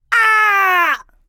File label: Enemy Generic Human Death Falling